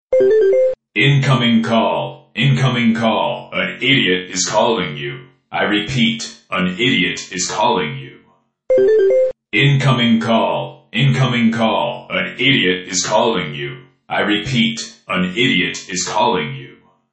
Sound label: incoming call